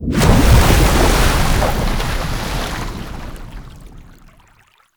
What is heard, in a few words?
Water Jet Platform Burst, Waterfall; Ambience